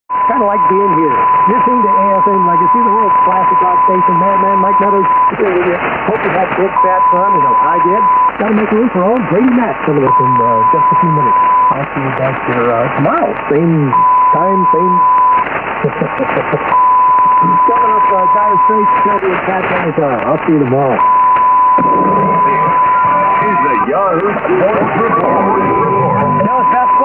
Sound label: noise canceller